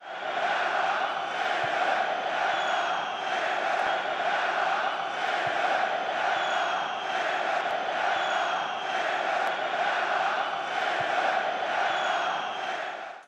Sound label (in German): werder bremen fanrufe